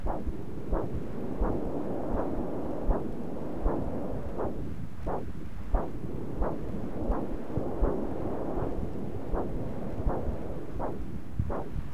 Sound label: no bubble hearing veinous blow flow windy sound and heart pulses